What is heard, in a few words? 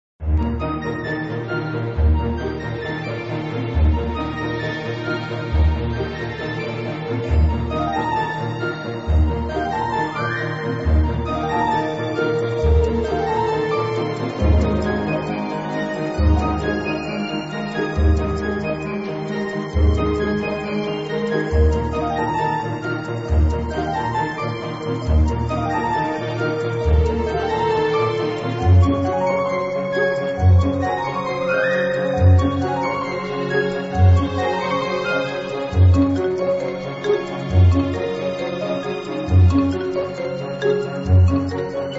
Children Song BGM; Samsung 2008; TV